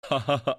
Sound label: funny faces, laugh; mahoutsukai no yome; my ancient magus bride